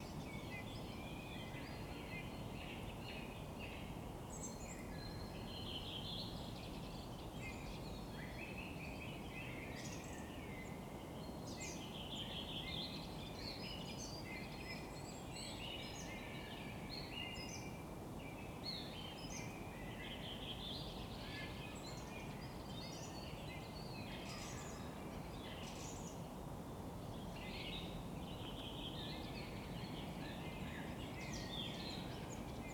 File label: Park Ambience Loop